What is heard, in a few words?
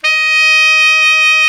baritone sax D#4; InVision Interactive; Lightware VOL-1; Instruments & Percussions